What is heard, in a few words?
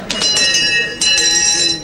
wake up bell